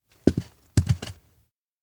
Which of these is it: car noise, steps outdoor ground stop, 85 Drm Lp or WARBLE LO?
steps outdoor ground stop